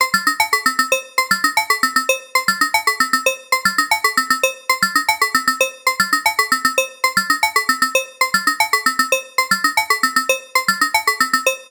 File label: Simple Seq square